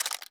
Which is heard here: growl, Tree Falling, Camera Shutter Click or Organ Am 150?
Camera Shutter Click